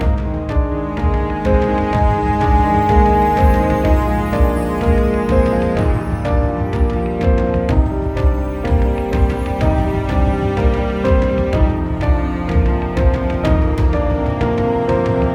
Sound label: Looping piano